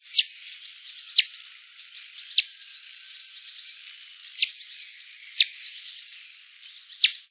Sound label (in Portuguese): beija flor preto